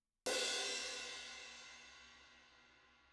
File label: crash R, drums; film